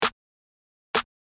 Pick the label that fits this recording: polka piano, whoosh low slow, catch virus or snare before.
snare before